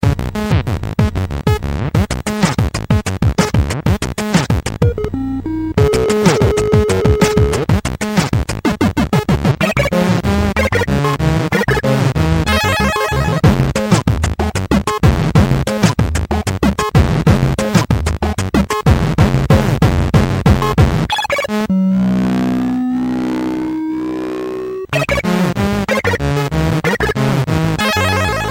Nokia Mono Poly

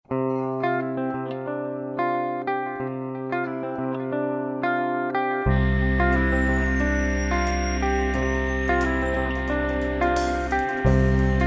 Slow Guitar

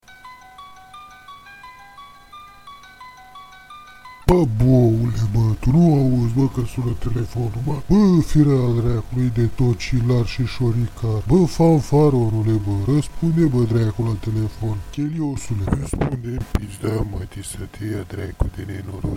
Nokia Romanesc

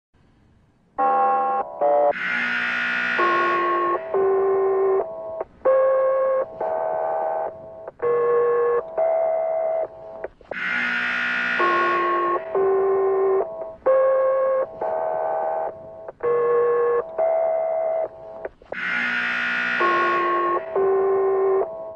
Emergency Tone

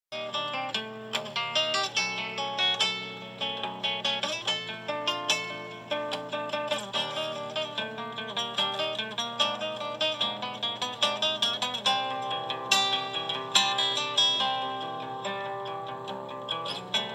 acoustic; ET fly

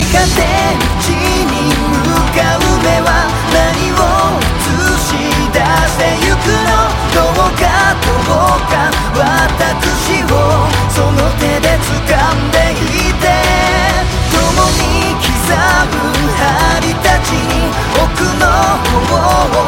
Chorus

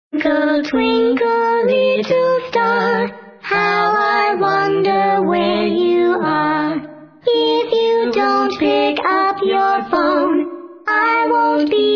Twinkle

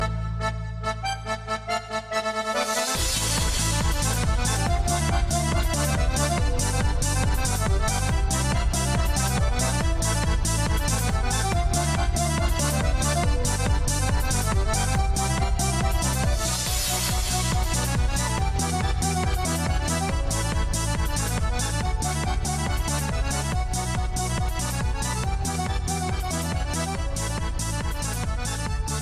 Techno Accordion

